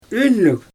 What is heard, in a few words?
good singing voice